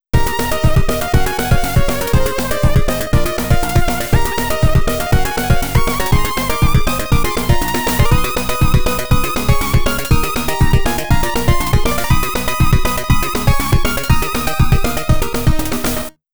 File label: POWER UP (YM2203); New City Hero